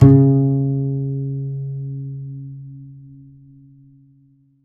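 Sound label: double bass C#2; Univers Sons; Jazzistic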